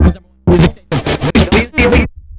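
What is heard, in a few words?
rhythm loops, 100 bpm; Rarefaction - Poke in The Ear With A Sharp Stick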